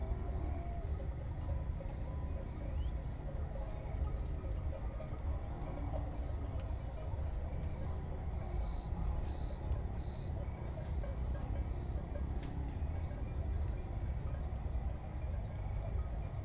African Savanna

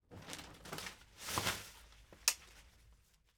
Umbrella Close

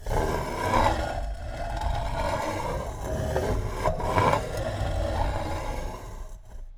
Animal growl

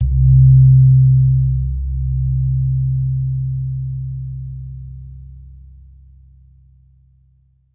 Gong Lanang

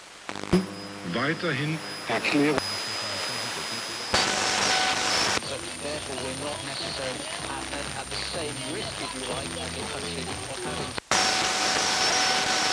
Radio

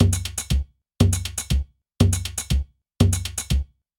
Drumming

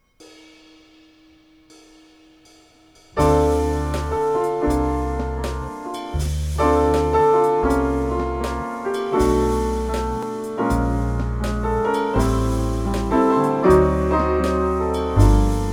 Jazz

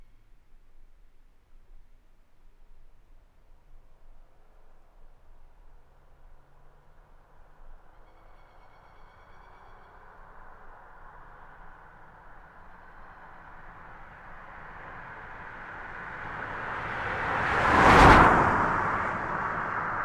Car Passing Very Close

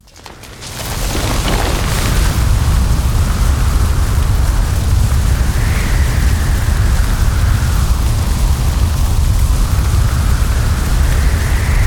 Pyroclastic Flow The Pines Are Blown Away

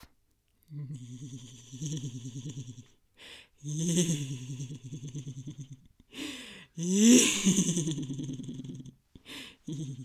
Comical Evil Laugh

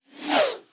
Whoosh

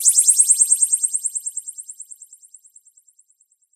Shimmer

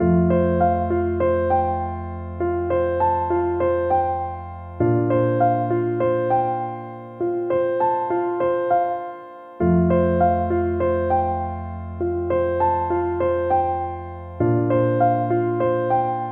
Tranquil Cinematic Piano

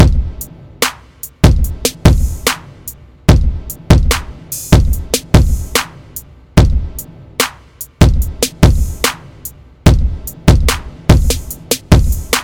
Loop

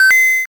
8 Bit Powerup

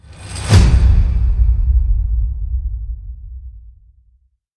Whoosh Epic Level Up

